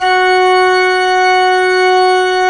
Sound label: ORG P. ORG; Roland - LA Composer vol. 1